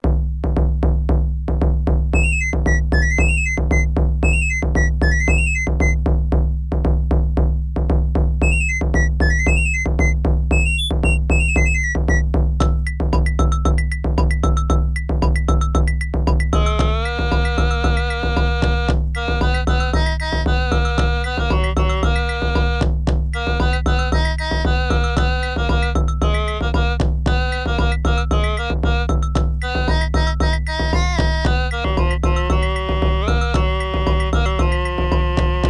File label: Folk; animal crossing full gamerip